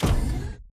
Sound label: footstep jog close; Titanfall 2